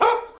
bark; super trench attack

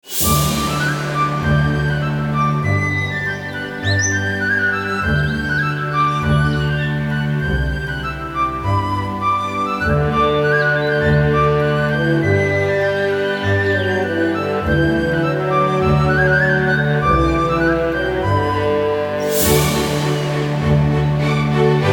Seaside Town Approaching Peace Relax; Serious Sam 2; Ellenier

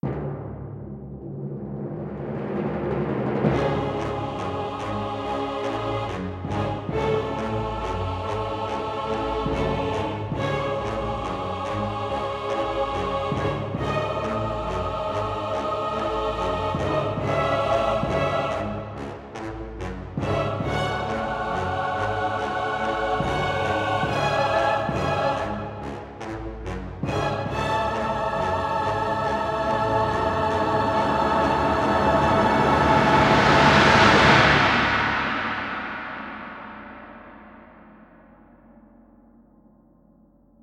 Stinger Frantic Long; Pillars of Eternity

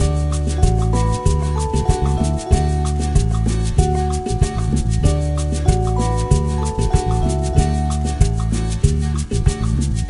Cruise Music; Diner Dash: Flo on the Go; NDS

